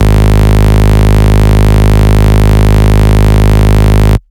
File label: Bass Shot G#; Mutekki Media; Vengeance Electroshock Vol.2; Bass Shots